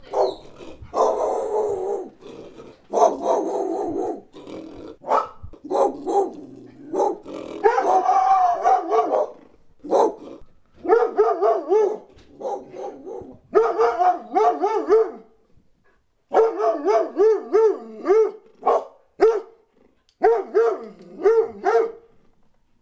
Dogs Large Barking; Animals; Sony Pictures Sound Effects Series Volumes 1-10; Sony Creative Software

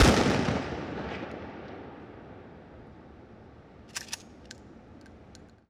Mossberg Shotgun Blast & Reload Wet; Weapons & Firearms; Sony Pictures Sound Effects Series Volumes 1-10; Sony Creative Software

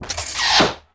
Arrow Movement Medium, Weapons; Weapons & Explosions; Sony Pictures Sound Effects Series Volumes 1-10; Sony Creative Software

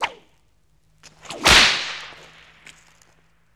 Whip Crack; Weapons & Explosions; Sony Pictures Sound Effects Series Volumes 1-10; Sony Creative Software

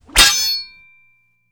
Sword Swipe And Klang; Weapons & Explosions; Sony Pictures Sound Effects Series Volumes 1-10; Sony Creative Software

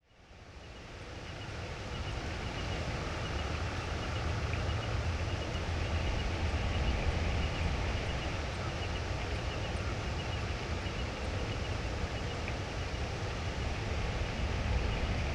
Otherworldly Jungle Atmosphere; Sound Design - Fantasy; Sony Pictures Sound Effects Series Volumes 1-10; Sony Creative Software

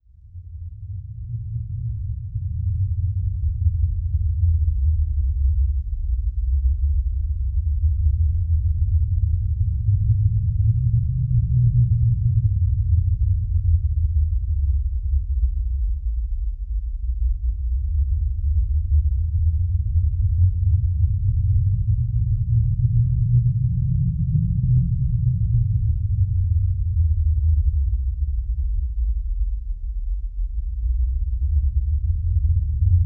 Rumble Low Frequency; Impacts & Destruction Sweeteners; Sony Pictures Sound Effects Series Volumes 1-10; Sony Creative Software

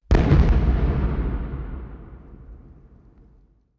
Explosion Distant Large; Weapons & Explosions; Sony Pictures Sound Effects Series Volumes 1-10; Sony Creative Software

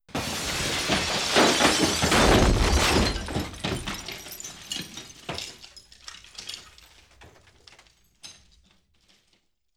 Explosion Glass Debris; Weapons & Explosions; Sony Pictures Sound Effects Series Volumes 1-10; Sony Creative Software

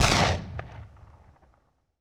Benelli 12 Gauge Shotgun Blast; Weapons & Firearms; Sony Pictures Sound Effects Series Volumes 1-10; Sony Creative Software

